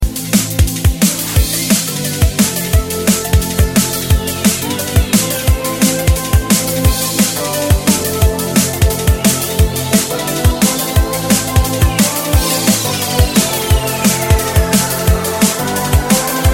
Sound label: Dnb Ringtone